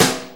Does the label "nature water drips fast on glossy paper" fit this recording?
No